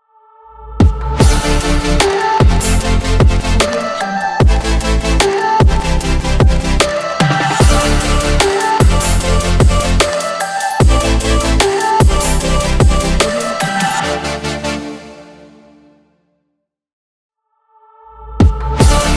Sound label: future bass intro logo